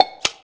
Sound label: Cam Shutter; LG GM730 Eigen